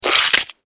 クリーチャーが食べる音

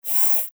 ロボットアーム

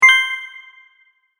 決定

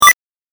8bit 選択

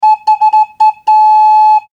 スライドホイッスルファンファーレ

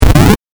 8bit ゴリラ

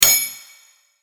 剣ぶつかり合い

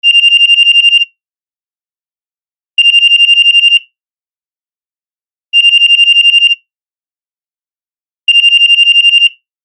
電話 着信音 4回

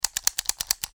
カウンターでカウント

